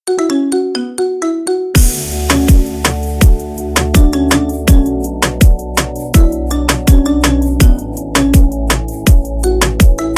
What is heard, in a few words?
marimba remix